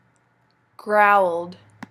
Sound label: growled